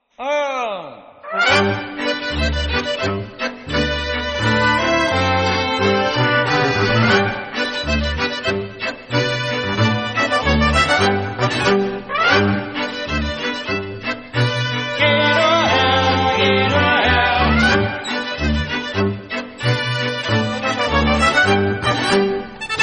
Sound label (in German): Boarischer